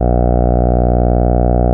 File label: TAURUS C3, synthesizer; Hollywood Edge - Giorgio Moroder Rare Synthesizer Collection